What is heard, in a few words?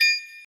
COIN; Idle Champions of the Forgotten Realms